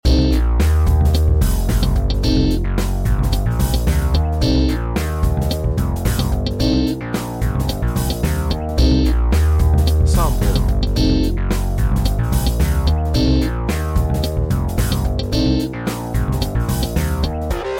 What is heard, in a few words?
funk